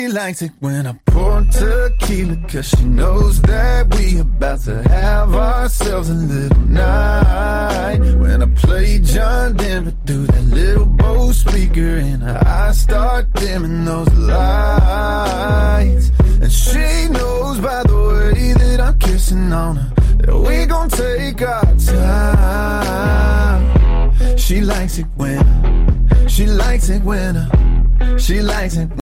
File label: the noisy crowd ringtone; iphone